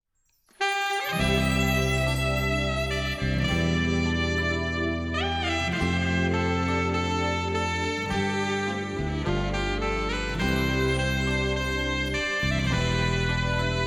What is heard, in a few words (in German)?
instrumental